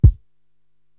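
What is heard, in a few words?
bass drum Dark Dance, Korg TR-Rack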